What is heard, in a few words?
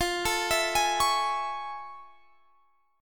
A Major 7th F; AM7/F arpeggiated